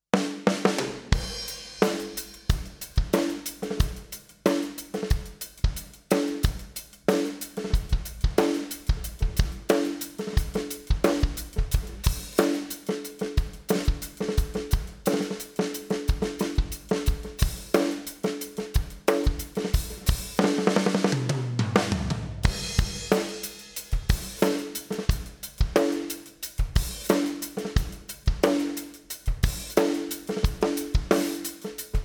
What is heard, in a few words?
Drums; Aroused